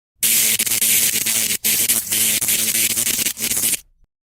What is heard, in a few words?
Electric Shock